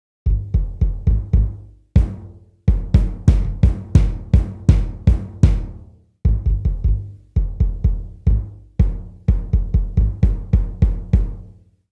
kick drum boom; 22 Inch Boom Kick Drum; professionally recorded acoustic drums

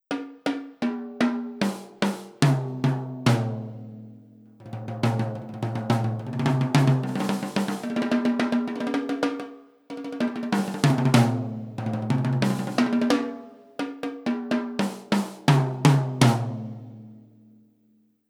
bop toms

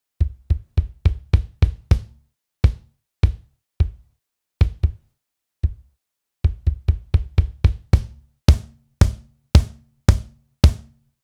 kick drum full, drum samples; 20 Inch Full Kick Drum; professionally recorded acoustic drums

